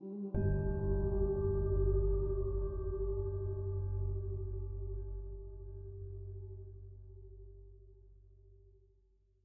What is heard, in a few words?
feature Splash One Shot; mercy of the gods